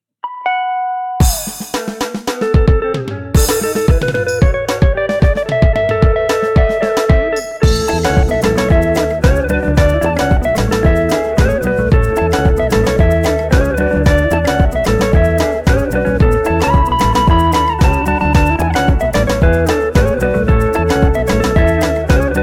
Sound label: Afro congo; Afro congo vol 2; Negusfirst